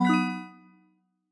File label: success